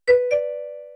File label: ding dong; Chatty